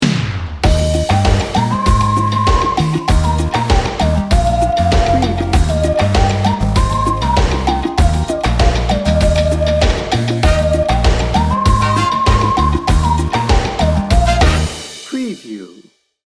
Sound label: tropical party 15 second